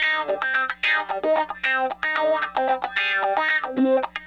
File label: Raw Funk Guitar